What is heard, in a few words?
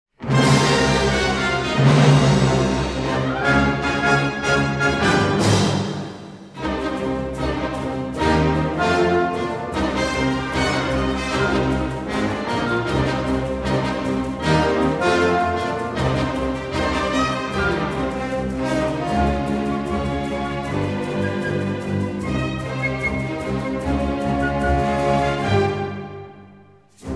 karaoke